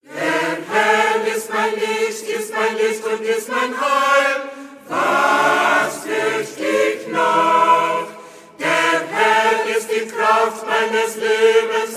choir; ksb choir

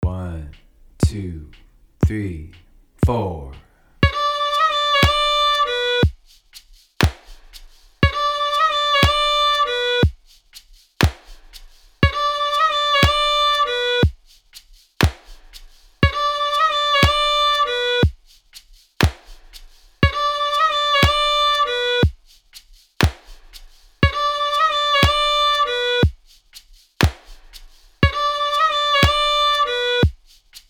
Miss Monaghans Reel Variation warm up, fiddle

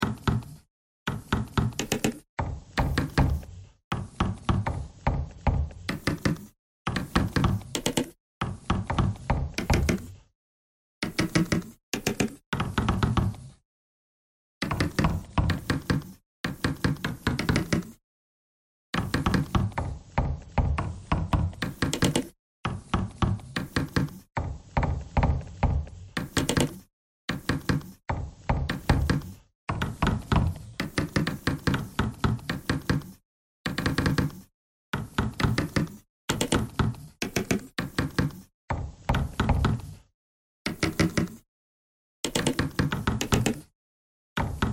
bagpipe noise

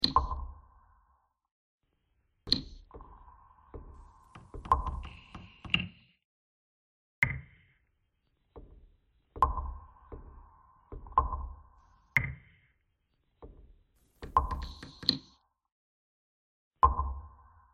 bagpipe simple

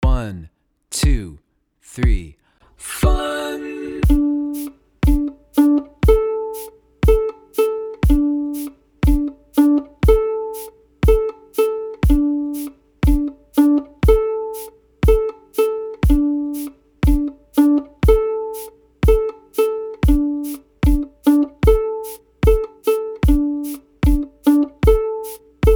Hoedown D A, fiddle

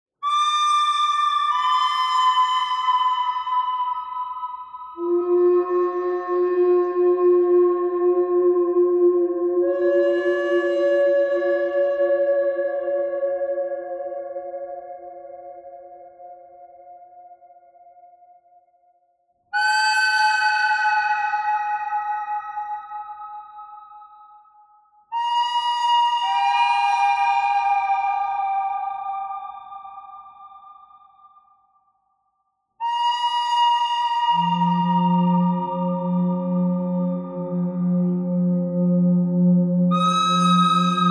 bird thread harmonic; birds

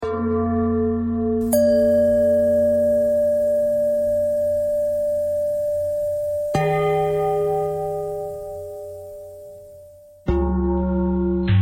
bell thread bend thread

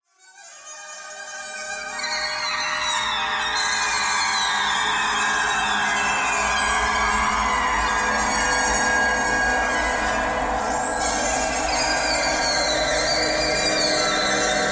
clarinet clarinet jazz thread noise; clarinet geese

